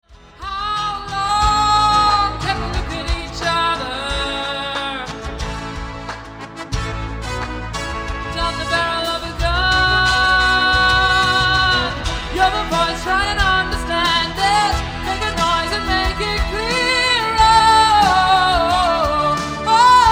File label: chorus only